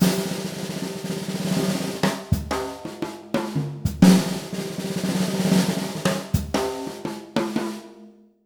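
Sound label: breakbeat, 120; Univers Sons; Jazzistic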